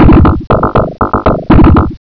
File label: drum; qsound